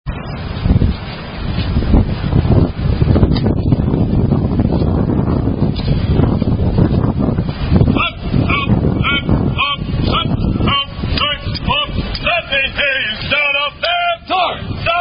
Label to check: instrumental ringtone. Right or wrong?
Wrong